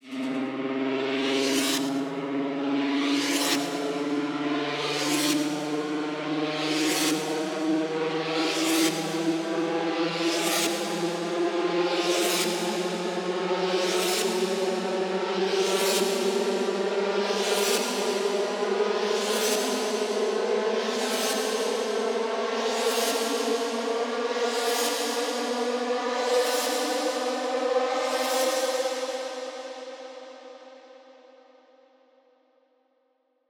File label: RISER 135BPM C